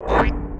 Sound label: Attack; Eft; Lion Mask